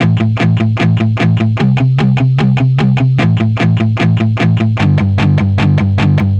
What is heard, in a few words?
150BPM Guitar Heavy A min; Antidote Advent